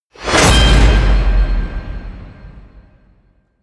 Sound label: hit metal